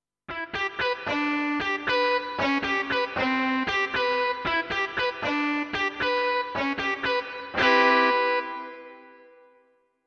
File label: Distortion